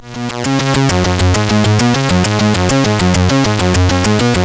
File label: sid CSG8580R5; Dungeons of Doom